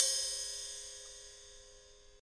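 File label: ride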